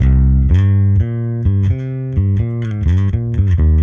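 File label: Electric Bass Bridge Finger 125 C2; musicradar; bass boutique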